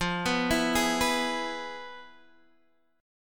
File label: F Minor Major 7th Flat 5th Guitar DADGAD; x,8,9,9,11,9